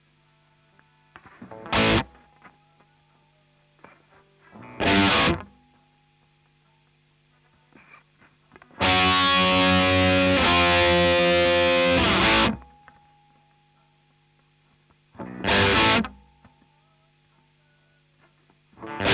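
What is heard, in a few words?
guitar; Marshal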